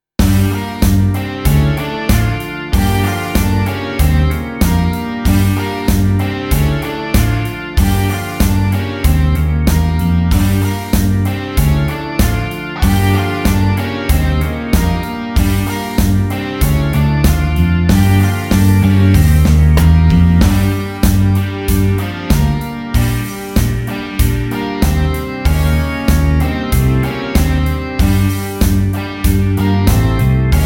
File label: Blues Rock in Gm slow Rock Straight 95 bpm